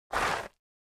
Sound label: Object Dragged Acros, rocks & dirt